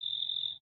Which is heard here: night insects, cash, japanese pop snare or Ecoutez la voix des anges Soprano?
night insects